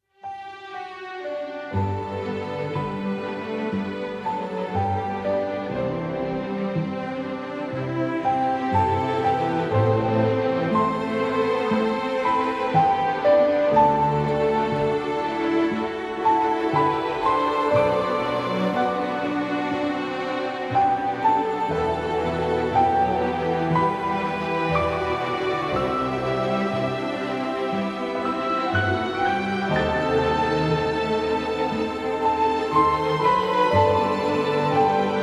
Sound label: Adorable Ambient